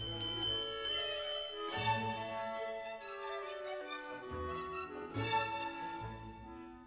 ambient loop

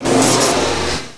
Open

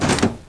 Plat door

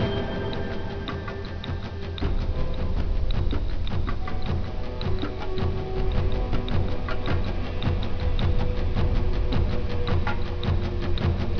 loop; BlairWitch; WorldsPlayer

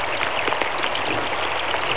rain

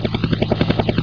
heli; Museum of the USSR

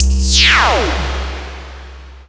laser

